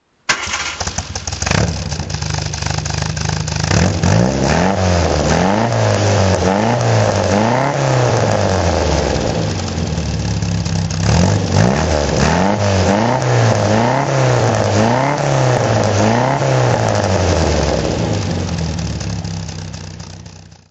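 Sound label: healey sprite frog throttle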